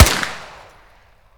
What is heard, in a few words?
kedr shoot; metrocraft; weapon